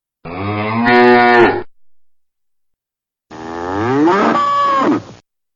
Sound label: Cow Sounds; RailPro